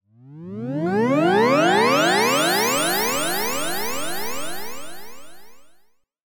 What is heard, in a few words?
sound design ascend fm delay synth